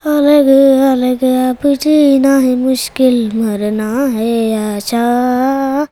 CHILD, Indian Traditions

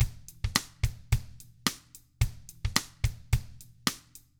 POP, Drums

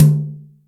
Brsh Jz Md, Acoustic Tom; Yamaha DTXpress

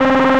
link, signals; AKAI; FX SIGNALS

